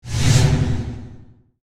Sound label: Beam swish